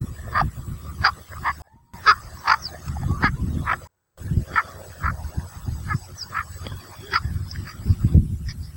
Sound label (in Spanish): garcita bueyera